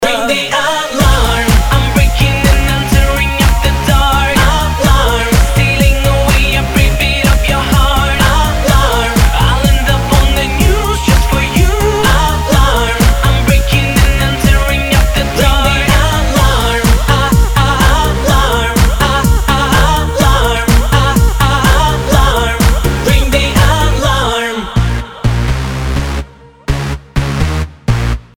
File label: alarm